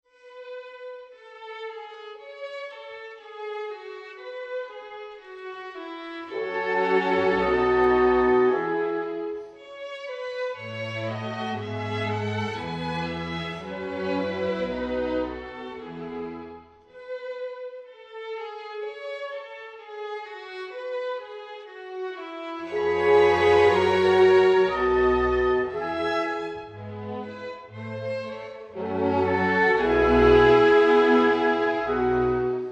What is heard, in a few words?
Violin Concerto in A Major, Adagio